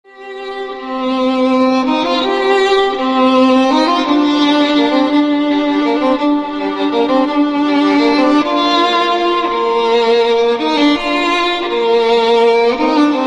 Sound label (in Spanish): BSO Violin